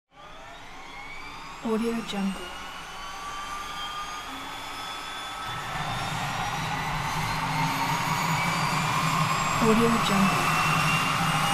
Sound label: jet turbines are gaining momentum